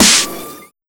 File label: FX, Big Beat Drumloops